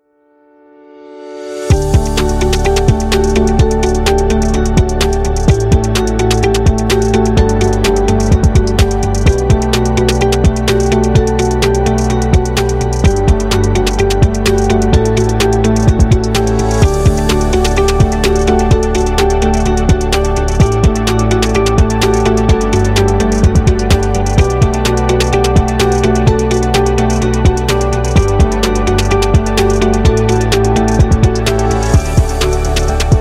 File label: Professional